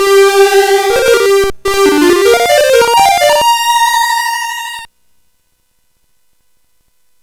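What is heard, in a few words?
Operation Hormuz, MOS 8580 SID chip music, games; CSG8580R5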